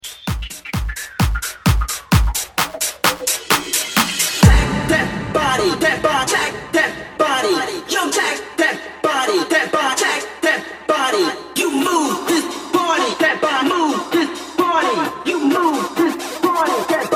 130Bpm

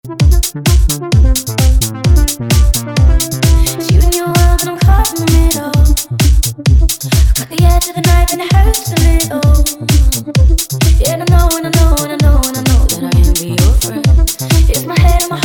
Club Party; ReDrum

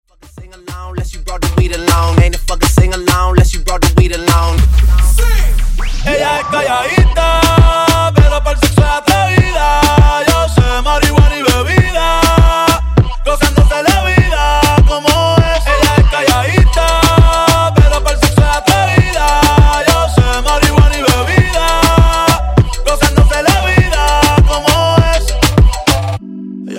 Funk Break Intro Outro, 100 Bpm

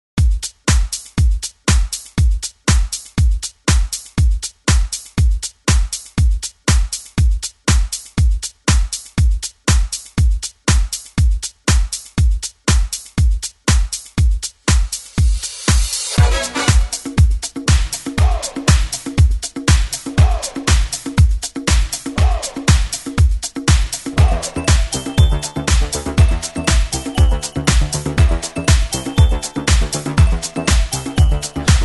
80s ReDrum; DJ Mhark

